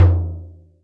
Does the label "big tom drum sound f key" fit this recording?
Yes